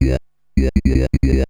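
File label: synth bass, drum and bass, 160 bpm, C; eJay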